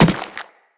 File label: Infested Runner Step Default, Footsteps